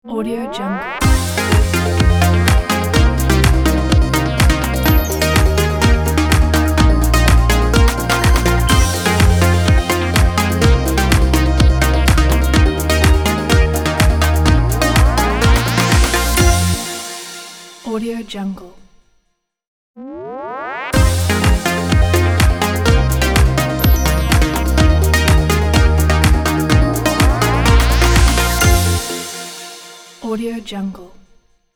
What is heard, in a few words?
disco logo